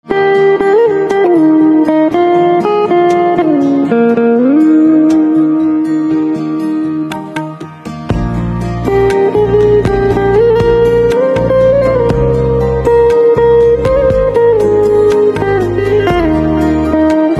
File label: Instrumental Ringtone, banjo